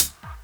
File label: Dance Mega Drums; Best Service